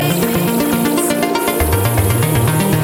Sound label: Space Msg